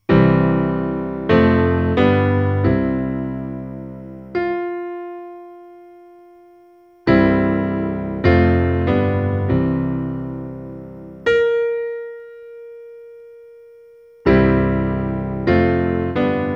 Pitch Recognition (Chromatic)

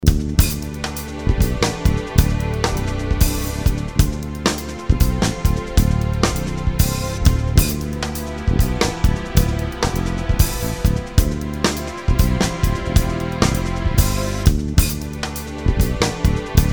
D dorian, Dorian Pedal

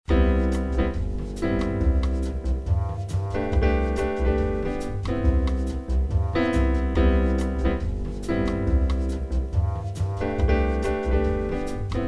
Major 7

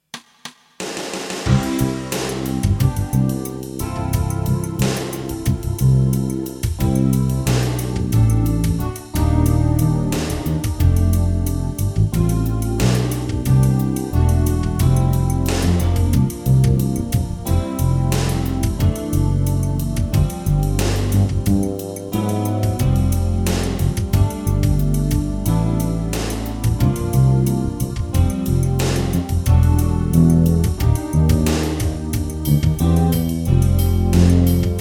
Lydian, fusion groove illustrating Lydian

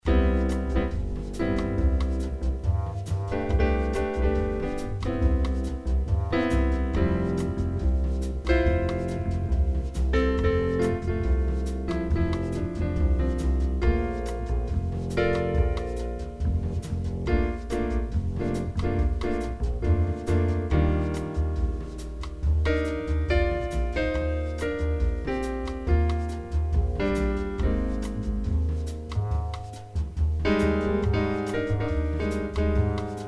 Cycle 5 Maj7

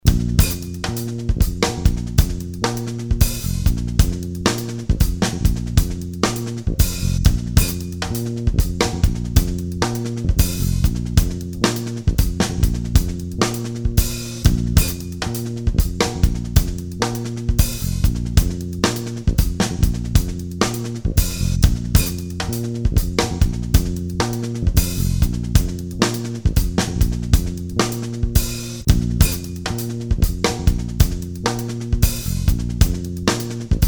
Bb Pedal Samba

